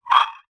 household glass bowls x2 stacked turn scrape squeak; zapsplat